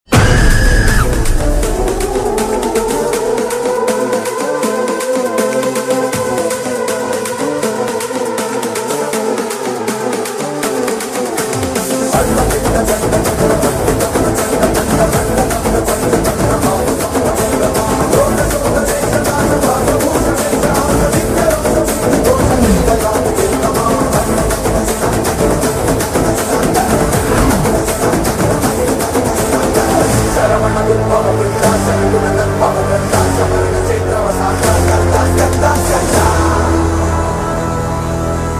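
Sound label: climax background music; skanda; ram pothineni; sreeleela; boyapati sreenu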